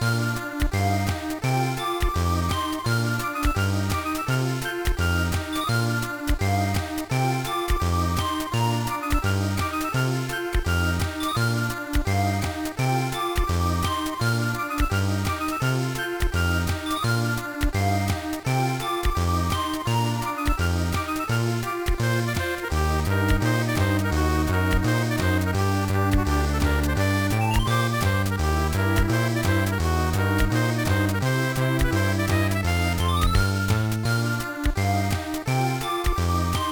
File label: BGM; Ochaken no Yume Bouken; GBA